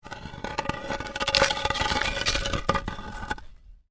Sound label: Ice: drag large block of ice short distance; Snow; Stuart Duffield